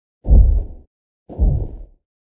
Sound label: Sandscrit Walk Sniff to Idle; moul